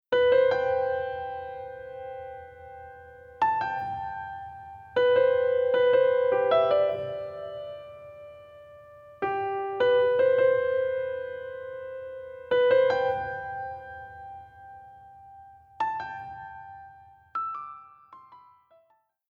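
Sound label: Reverb Room Hall; Mix Send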